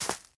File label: Foot Weeds Shoes Walk; lucius iii gamerip 2018 pc linux